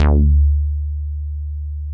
MOOG1 BASS D2

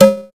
Conga Whoop, Percussion Hits; Drum Hits; Nu Dance Electro; Zero-G; Soundclash; Acidized Wavs

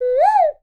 bird call; Voice Crystal - Steve Reid Definitive Percussion

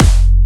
Jump Kicks, Bassdrums

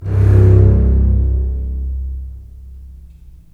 STR, STR Full Short; Roland - LA Composer vol. 4

